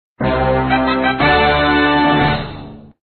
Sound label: gameover; TankDefender